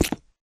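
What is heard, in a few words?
Grunt Movement Footstep Walk Concrete; Titanfall 2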